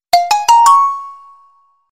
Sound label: Percrimba